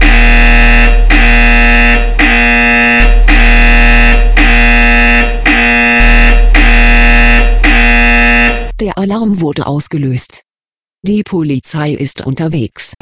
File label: Alarm